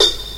nineties rock hat sample a key, Royality free closed hi hat sound tuned to the A note